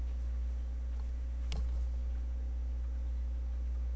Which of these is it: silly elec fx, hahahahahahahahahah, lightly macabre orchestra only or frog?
frog